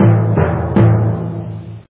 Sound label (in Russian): litavri melodiya perezvon